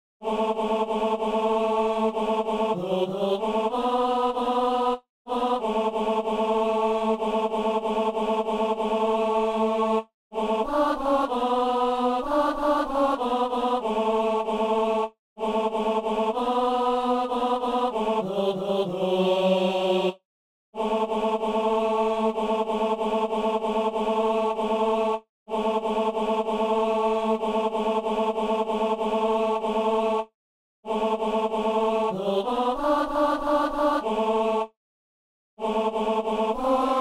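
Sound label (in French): ténor; levons les yeux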